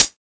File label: original hi hat g sharp key